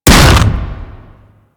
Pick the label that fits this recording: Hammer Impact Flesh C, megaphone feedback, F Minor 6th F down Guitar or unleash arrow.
Hammer Impact Flesh C